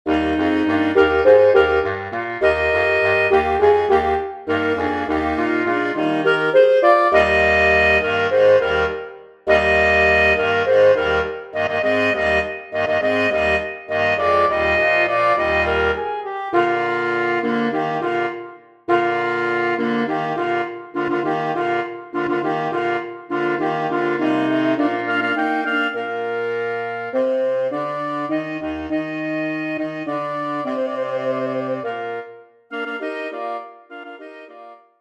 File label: halleluja chorus QUARTET; Handel